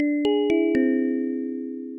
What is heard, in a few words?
grave jingle; gbjam12; jingles